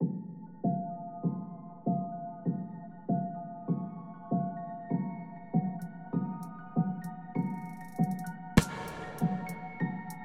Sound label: Cloud Rap Instrumental